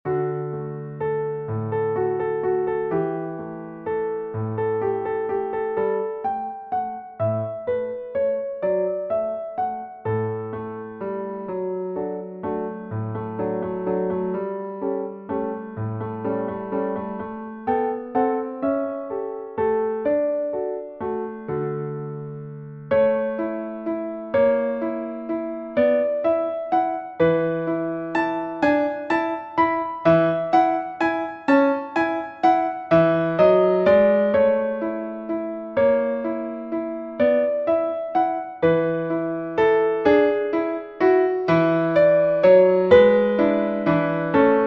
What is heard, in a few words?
little waltz